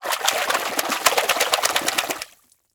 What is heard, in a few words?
Liquid Water Water Hands Splashing In Water Fast